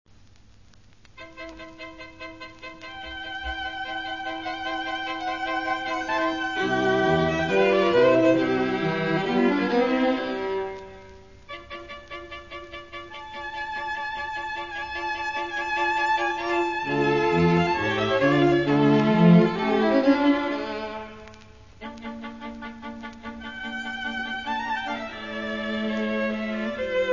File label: String Quartet in C major; Haydn; Opus 33 No 3; (Bird)